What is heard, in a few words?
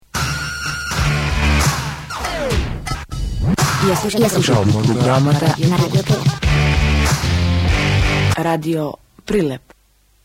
jingle; novinarska fela